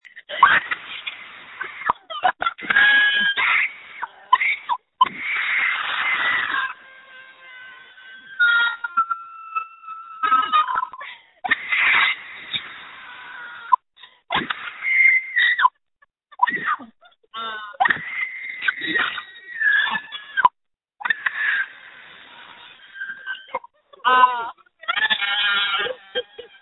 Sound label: Scream